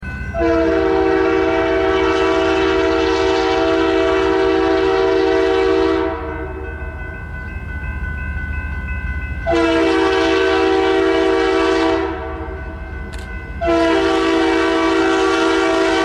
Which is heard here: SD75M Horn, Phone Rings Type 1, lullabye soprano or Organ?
SD75M Horn